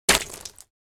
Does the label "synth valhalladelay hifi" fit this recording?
No